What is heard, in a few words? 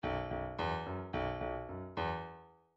bdf midi file grooves rock d rock d; Pr Rock D